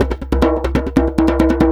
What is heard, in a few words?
Djembe loop 140 BPM; musicradar